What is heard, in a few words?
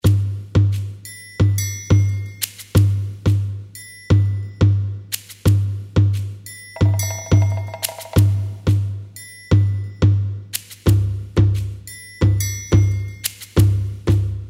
FOREST HEART BEAT